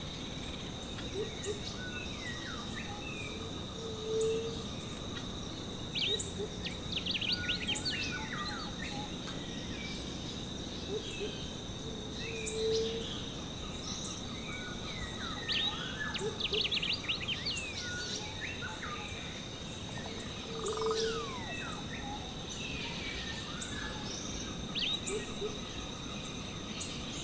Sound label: amb spoons island